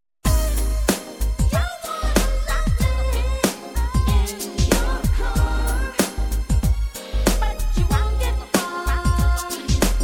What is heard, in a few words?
Radio Version